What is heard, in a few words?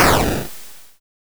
bakyuun